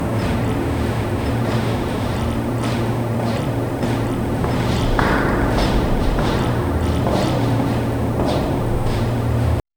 echoing footsteps on conc, hallway

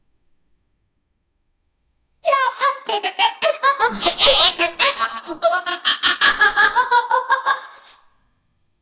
electricity explode

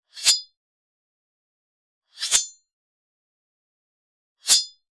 16 bit sound of a flaming

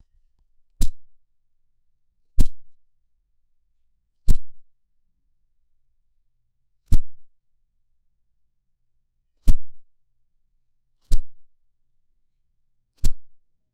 impact sounds of apple

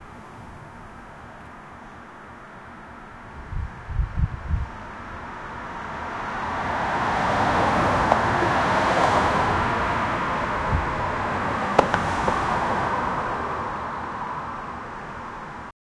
the sound of car noise

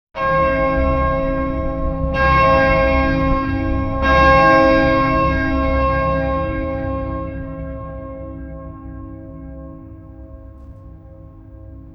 cathedral bells fractured, church

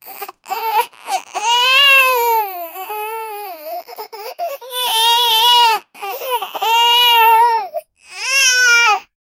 baby crying long duration; infant

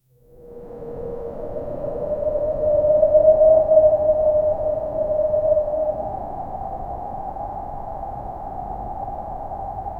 soft mountain wind distan; airy

